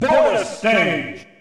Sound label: Bonus Stage; SSB